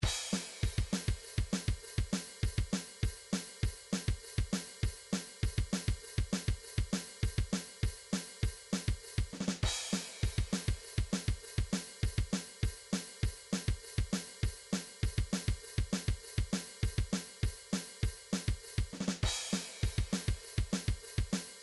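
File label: midi drums hard rock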